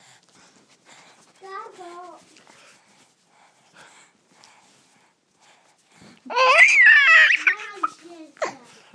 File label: giggling